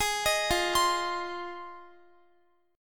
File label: F Minor 7th F Guitar; Fm7; arpeggiated; x,x,18,20,17,19